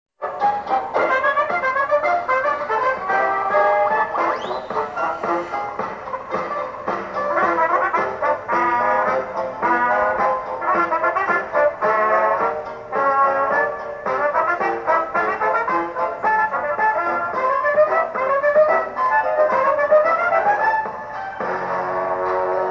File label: circus clown act